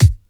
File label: natural hip hop kick one shot A key; kick drum samples; drum single hits one shots